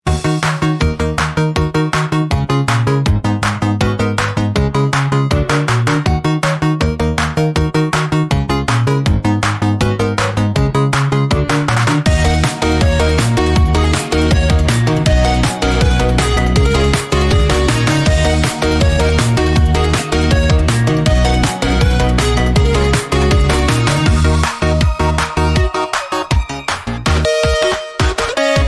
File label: background